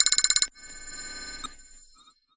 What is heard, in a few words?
Computer Alert